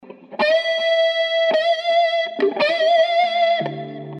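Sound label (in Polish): gitara elektryczna pentatonika a-moll zagrywka bending i wibrato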